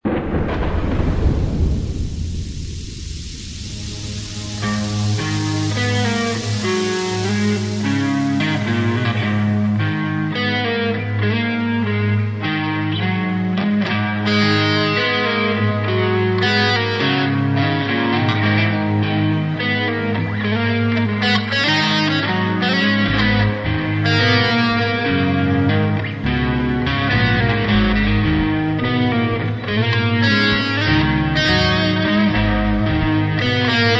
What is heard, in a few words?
rain